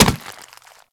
Infested Runner Step Default; Warframe

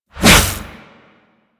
Briefcase Scythe Whoosh D; Crp Briefcase Scythe

